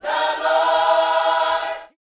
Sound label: Vocal Gospel PHRASE